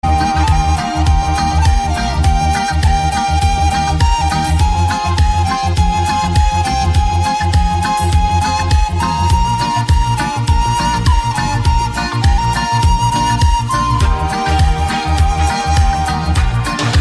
ocarina

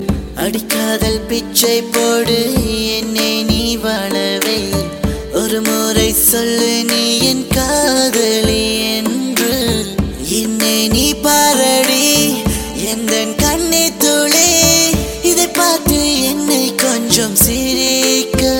Tamil

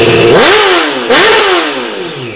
Roaring; Cbr

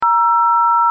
keypad press

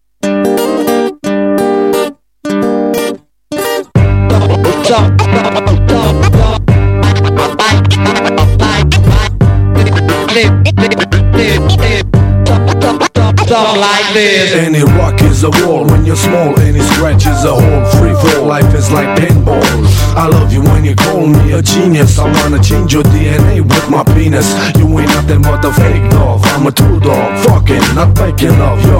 Hip-hop

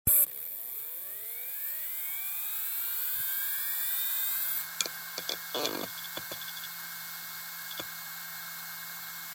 Hard Drive Startup

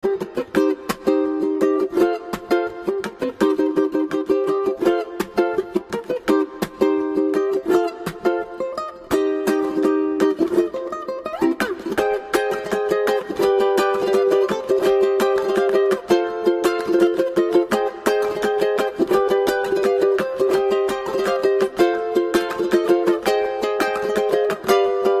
Ukelele

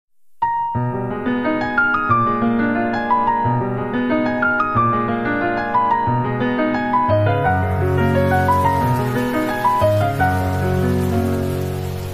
Summer Rain Piano

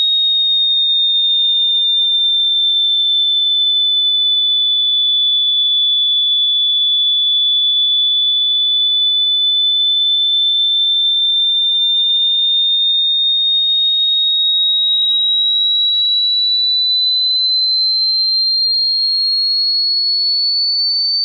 Irritating Touch

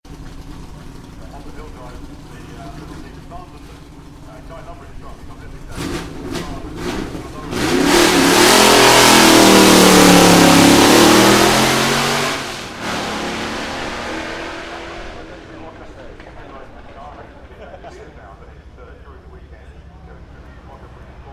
Ford V8 In Ac Cobra

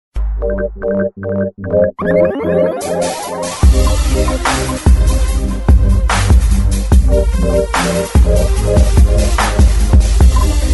Hip Hop Tone

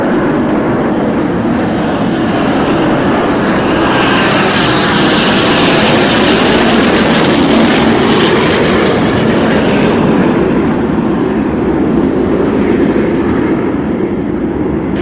Jet Nois; Pitch Black

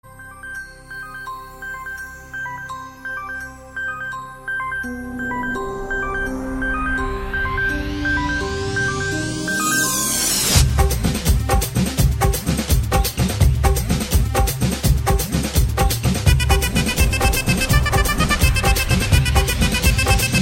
Hindi Movie

